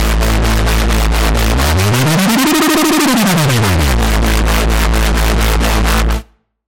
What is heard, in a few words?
SFX - Turbo Speed; Routed Sound; Sylenth1 Expansion; Chaos Bringer Vol.1